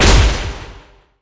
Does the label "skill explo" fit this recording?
Yes